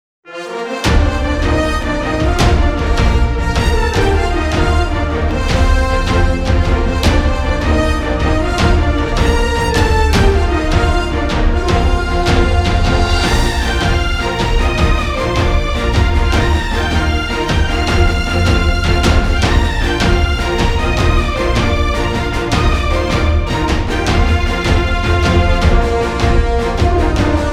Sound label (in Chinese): 颁奖演讲年会大气震撼管弦乐; 年会背景音乐